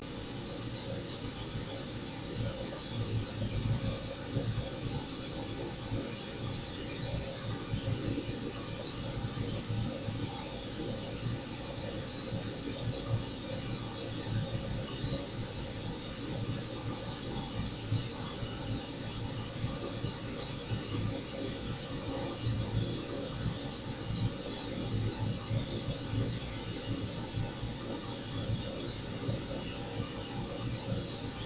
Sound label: Pink Noise